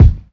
smooth bottom end bass drum sample D# key, Royality free kick sound